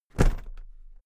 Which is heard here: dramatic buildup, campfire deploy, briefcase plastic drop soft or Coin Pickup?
briefcase plastic drop soft